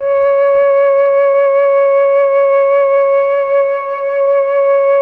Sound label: MELLOTROF; Masterbits - Vintage Voltage; MELLOTRON FL